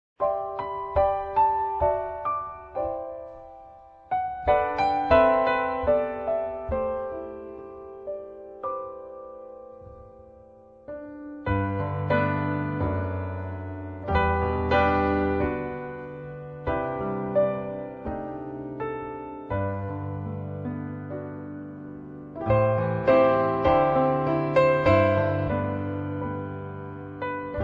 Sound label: jingle bells